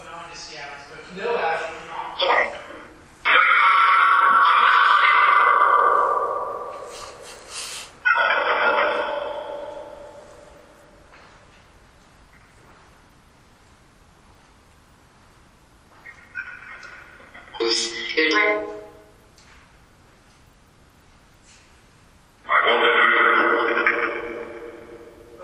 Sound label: Dungeon Orphanage Phasma; fairfield county infirmary investigation; 4th Fl